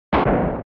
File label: Drum; SNES Fighting